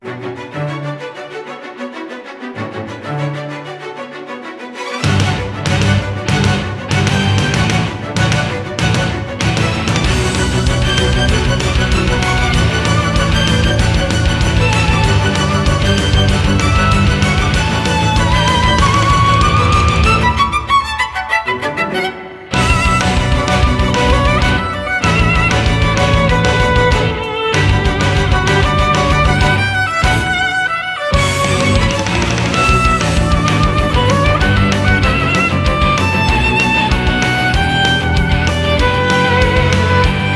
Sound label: battle; snakebone